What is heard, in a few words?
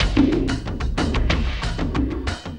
Cym Drum, Frame Kendang; Sonic Foundry (Sony Creative Software) - Friendly Fire; Drums; Long